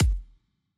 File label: kick E